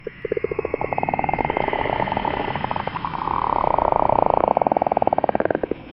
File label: slithering movement as th, moonlight